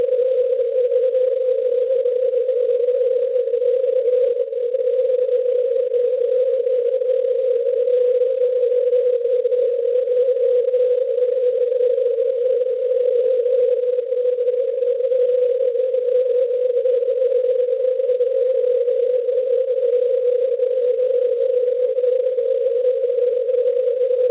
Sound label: cw